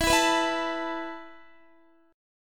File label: E Suspended 4th E down Guitar; strummed; Esus4; 4F,4A,5C,5G,5C,6E